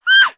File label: VOICE Female 36yo Scream mono; Infernal Radiation